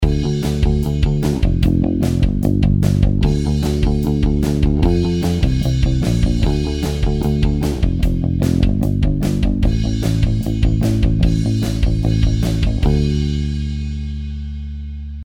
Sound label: Power Chord Rock Riff Backing Track